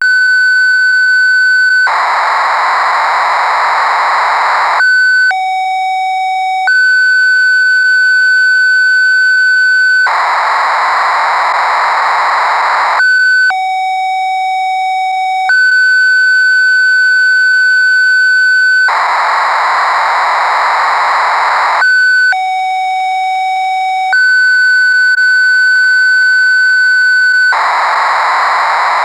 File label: Korg Poly 61 factory presets; Synthesizer Factory Patches; Cassette Interface